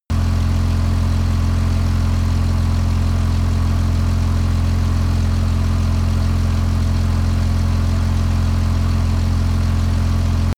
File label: motorcycle engine slow idle steady; motorraeder; verkehr transport